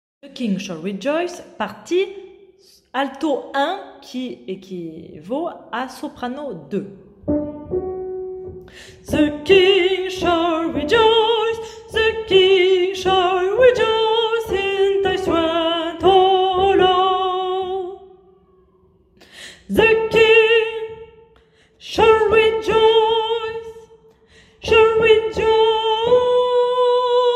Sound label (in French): partie soprano alto